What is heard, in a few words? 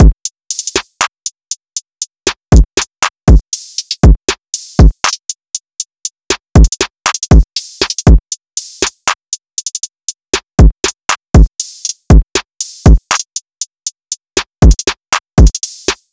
Drum Loop - 119 BPM